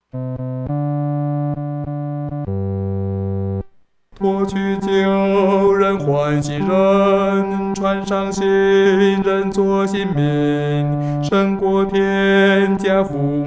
独唱 第四声

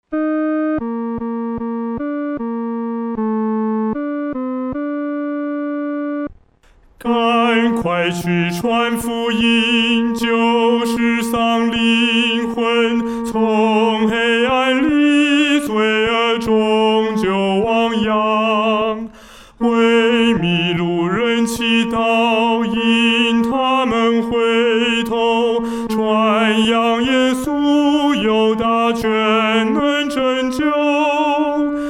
独唱 第三声

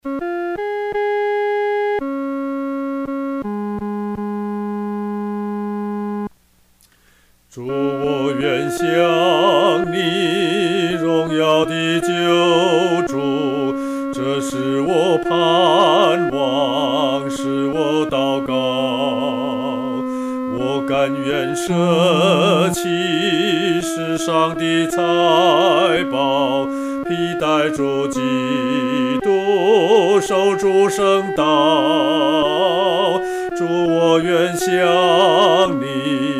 独唱 第二声; 主，我愿像你

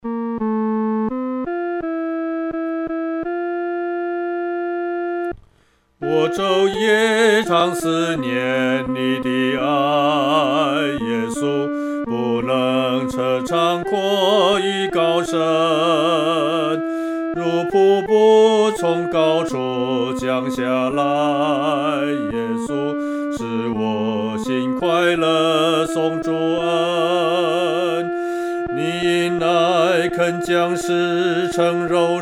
独唱 第二声

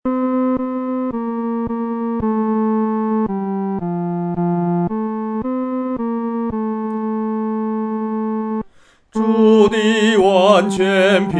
独唱 第三声; 完全平安

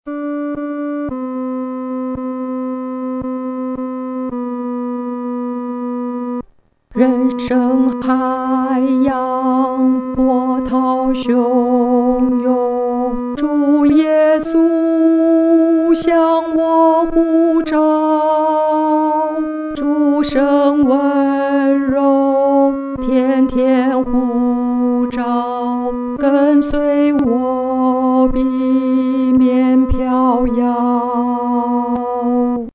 独唱 第二声

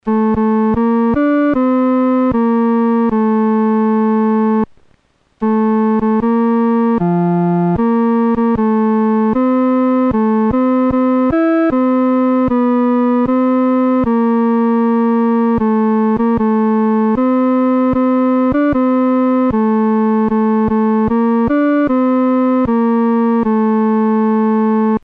独奏 第三声

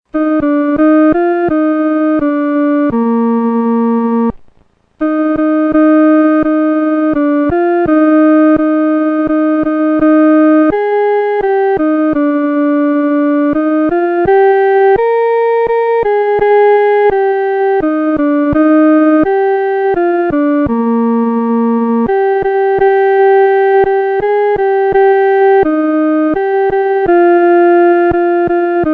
独奏 第二声

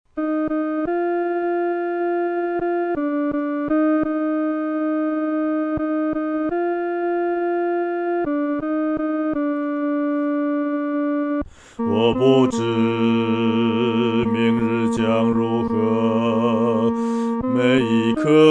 独唱 第二声

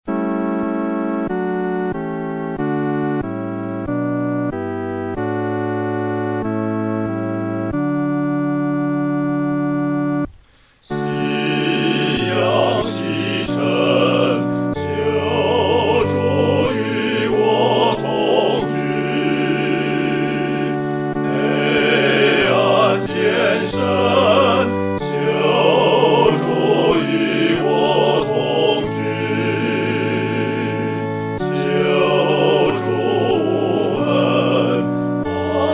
合唱（四声部）

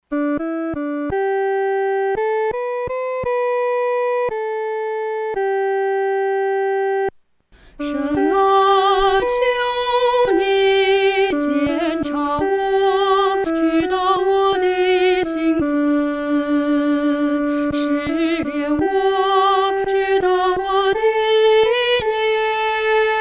独唱 第一声

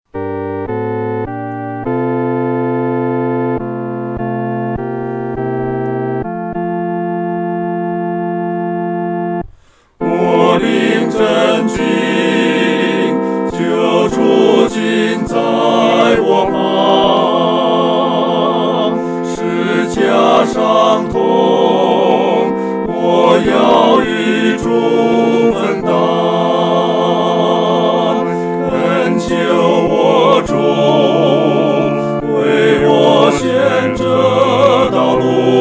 合唱 四声部

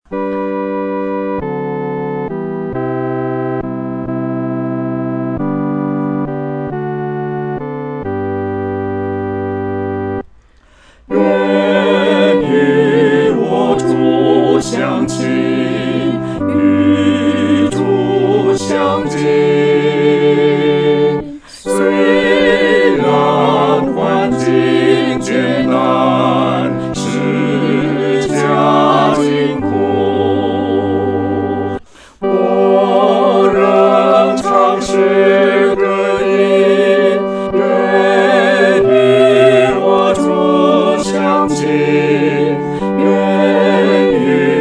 合唱 四声部; 与主相亲

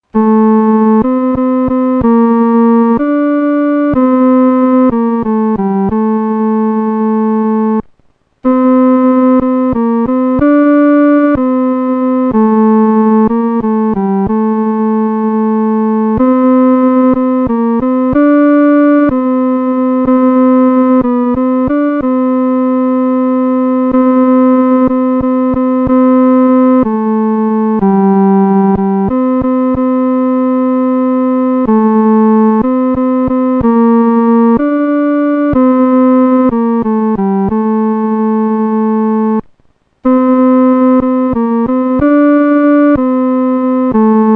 独奏 第三声; Take Time to Be Holy

